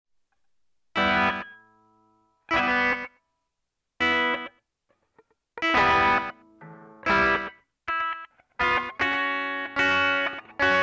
Lil Bit, guitar

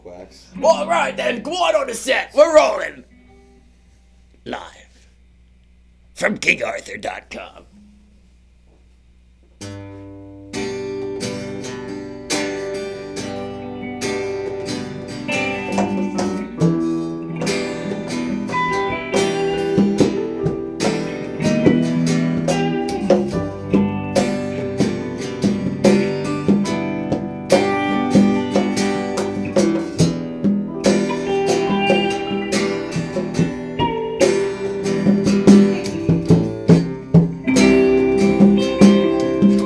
Quartet

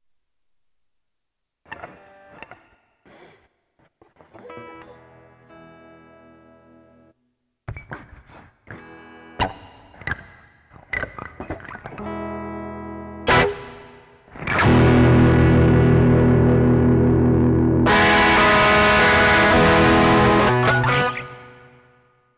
Ready, guitar

